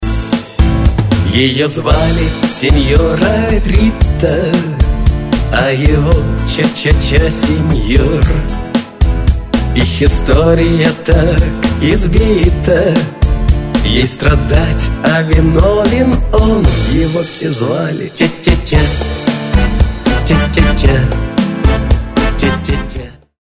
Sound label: cha-cha-cha